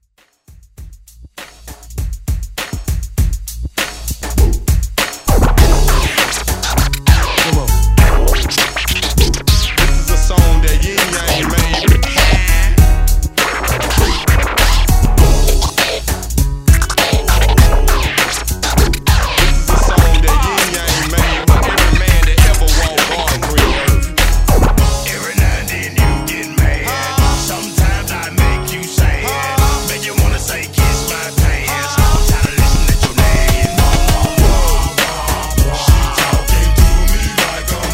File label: Throwback Scratch; Redrum